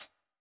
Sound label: 90s hiphop hihat; Reference beat bpm90